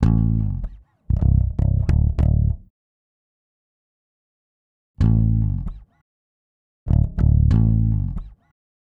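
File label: Bass, Hip Hop Exotica, 096 A minor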